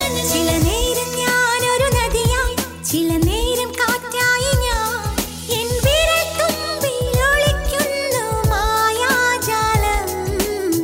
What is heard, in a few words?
malayalam music ringtone; alukkas